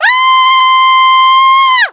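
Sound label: piglet shriek; Bad Piggies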